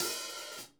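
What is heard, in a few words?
hats open closed; Sonic Reality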